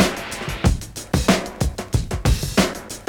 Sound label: DRM CHOP 93; AMG Gota Yashiki Groove Activator